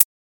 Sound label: Menu Click Hat